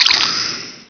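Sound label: squeak deploy, zombie rat; svencoop; hunger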